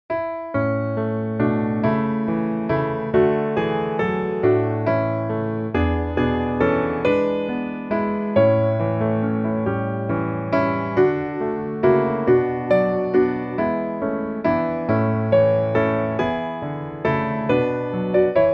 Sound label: piano